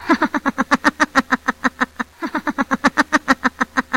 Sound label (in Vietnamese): Nhac chuong tieng cuoi de che